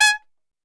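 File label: G#3 trumpet shot, Brass Super Section; Best Service; TRP SHOT; 90 s Sample CDs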